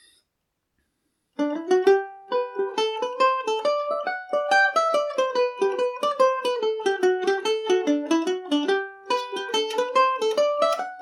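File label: broadway hornpipe revisited G major, mandolin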